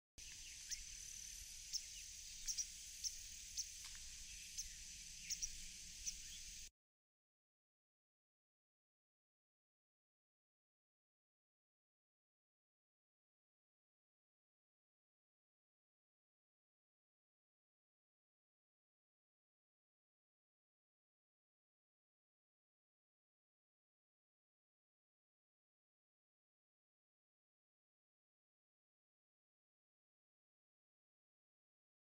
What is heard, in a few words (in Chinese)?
白鶺鴒